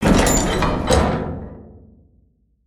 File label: Gate Open; foley